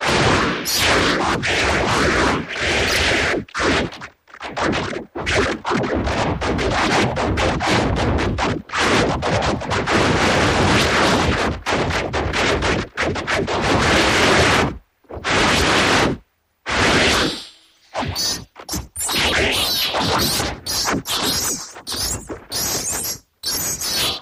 Blue Coil Continuou, arcs & sparks

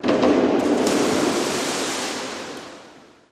Swimming Diving Off B, diving

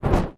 Torch Movement; torches

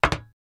FS Loafer On Metal, singles metal

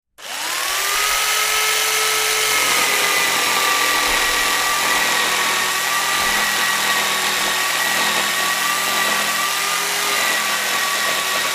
Jig Saw Run

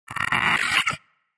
Alien Vocal Sound, aliens

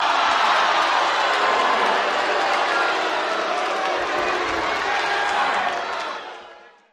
Crowd Yells Aah Then Boos

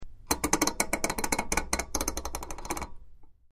Metal Dial Large Meta, switch clicks

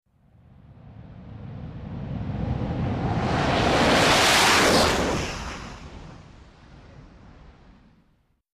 British Jet Fighter

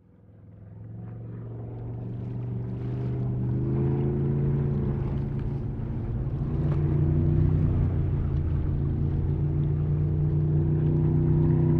Scarab Boat By With Accel, Very Loud Engine

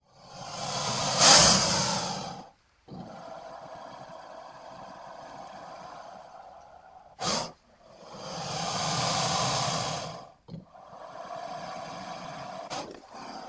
Alligator Snorts Chu

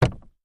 Carriage Footplates

